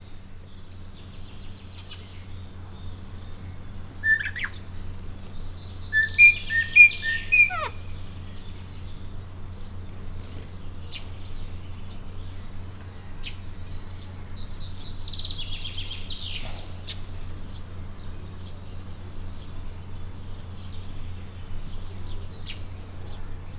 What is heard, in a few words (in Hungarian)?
lakokornyezeteben, rozellapapagaj; miskolci zoo 2018